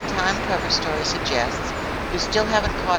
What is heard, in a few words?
noisy